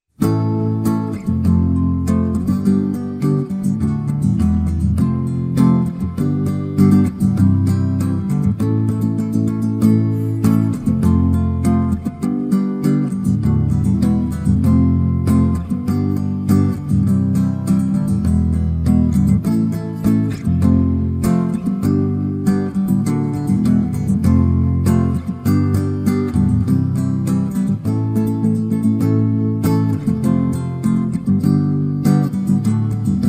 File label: Guitar Sample